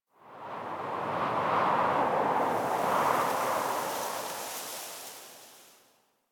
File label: Wind Gust Small, Ambience Plains; Warframe; Eidolon Plains; One Offs